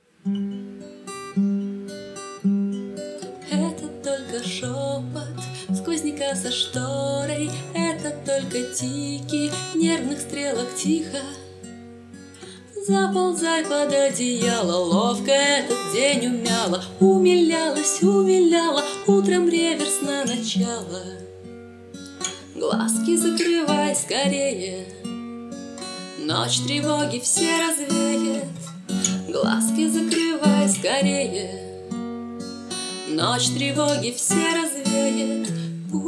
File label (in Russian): Колыбельная акустика